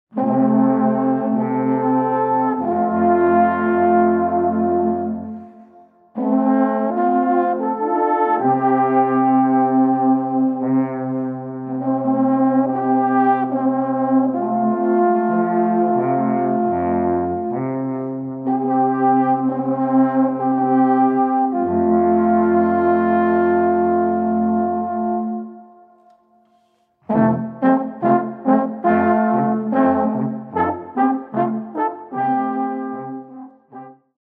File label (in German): Polka, alphorn